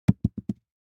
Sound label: DICE on Felt, Throw and Roll, Foam, 1 One Die; Samples; ArticulatedSounds; DICE Sfx Party (Extended)